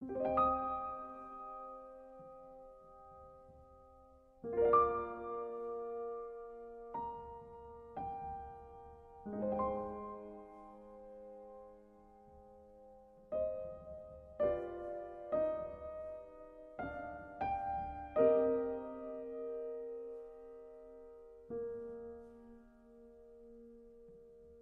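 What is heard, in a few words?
Piano Version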